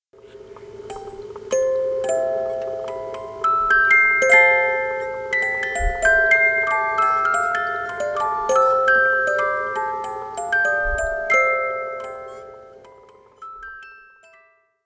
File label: bell before, musicboxes; ２００８年ベルオリジナルの音; リュージュ コレクターズ オルゴール ベル; このサンプル音は標準的な１台を無作為に選び同一メカの改善前後を同一の環境で録音しています; トロイメライ; 以下のサンプル音は２００８年ベル「トロイメライ」の出だしの１フレーズです; オリジナルでは最初の音がつまりぎみですが